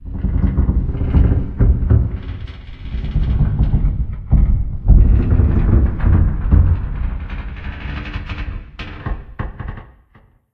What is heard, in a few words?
Metal Stress